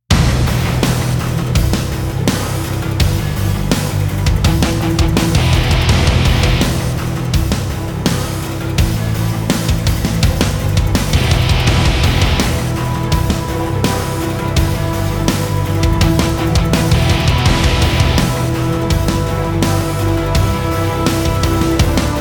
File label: Epic Speed Metal, No Choir; Gothic Storm Music